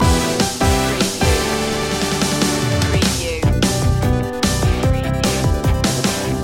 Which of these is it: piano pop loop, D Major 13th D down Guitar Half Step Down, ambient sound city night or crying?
piano pop loop